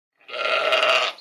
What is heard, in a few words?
animals sheep; Blind Drive